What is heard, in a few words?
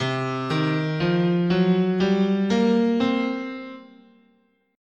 C Blues, scale